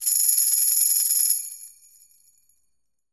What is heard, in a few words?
Tambourine Shake; Drums